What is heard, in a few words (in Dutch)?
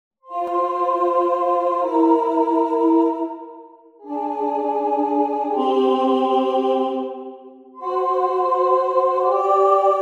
vierstemmige zetting; beweging van een reine naar een verminderde kwint en andersom